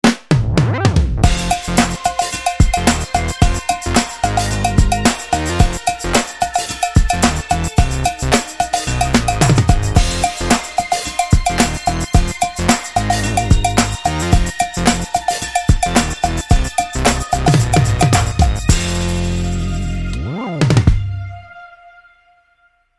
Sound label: 100 Year Old Cowbell